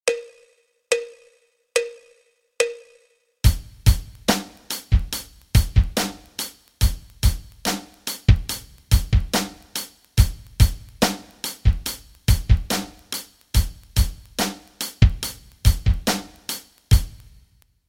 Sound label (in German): rock rhythmen